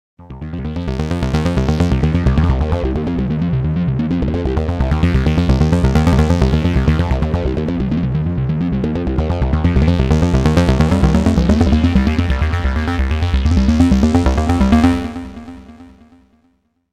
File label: Dirty Arp, Korg